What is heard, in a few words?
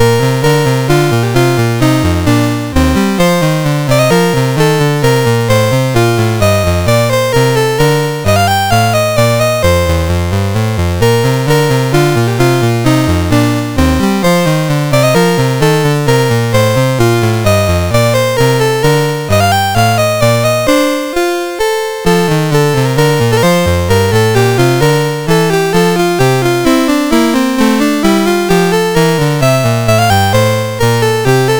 Minuet in B Flat; MOS6581R4